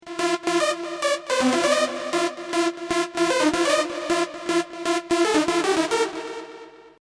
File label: trance, chameleon